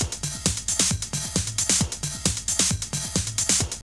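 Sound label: drum loop; X-Static Goldmine 3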